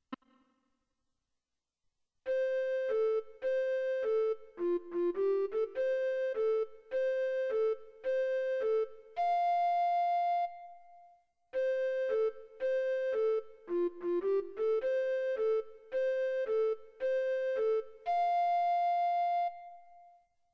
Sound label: recorder melody